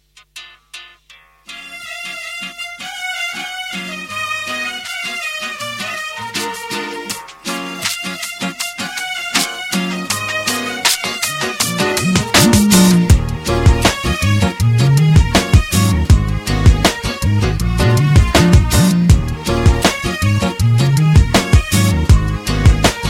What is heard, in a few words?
tamil soft music